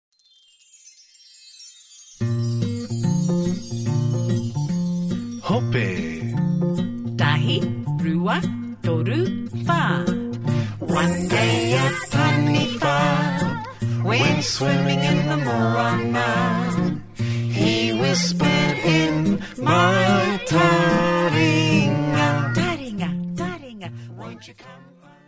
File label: waiata english